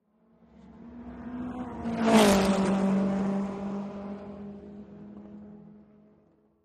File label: Vintage Race Car; By, Vintage Race Car Cu Fast By R / L, Shot Cu From The Track; 1952-1959 Vintage Race Car